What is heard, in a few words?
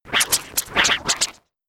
Dj Crazy Vinyl Whoosh